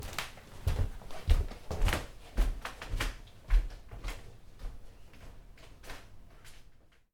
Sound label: Inside Flat Interieur Appartement